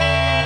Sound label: note a, banjo